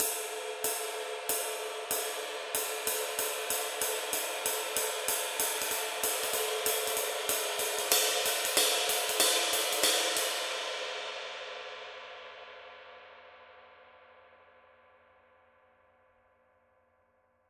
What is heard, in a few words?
PAISTE TWENTY MASTER DARK RIDE